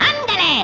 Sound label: engage; cinco de mayo; holiday themes; FrogPilot 0.9.7